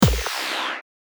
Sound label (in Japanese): 近未来ドア